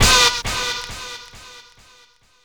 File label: Stab; Sonic Foundry (Sony Creative Software); Club Joints and Street Anthems